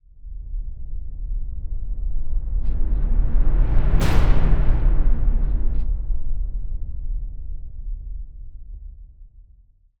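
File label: Reverse Boom Endless, Cinematic Metal Impacts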